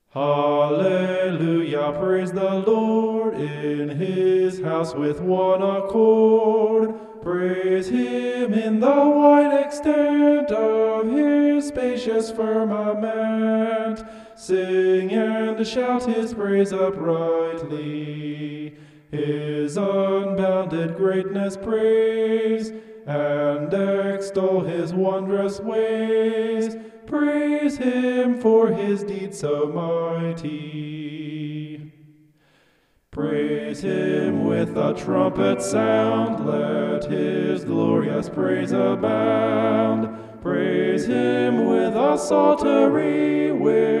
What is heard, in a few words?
vocal homoph; psalm 150